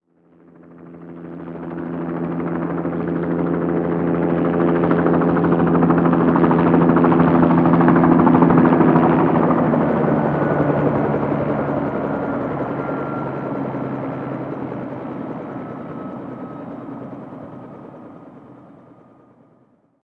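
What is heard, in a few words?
helicopter flyover stereo; amb elements; modern warfare 2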